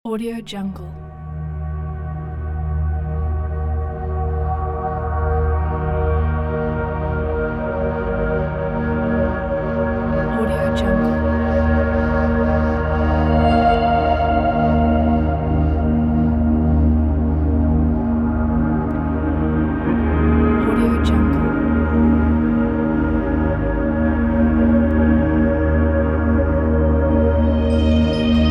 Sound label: ambient deep